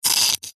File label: stone rock carve engrave scratch single; foley